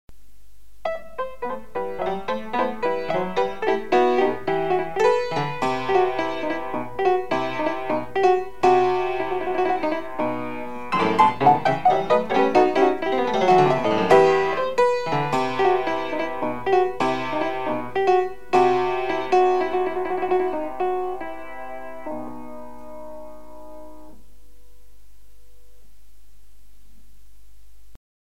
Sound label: gigue